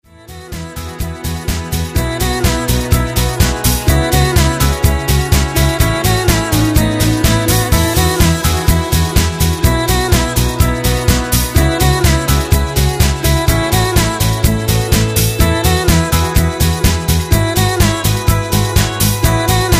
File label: Acoustic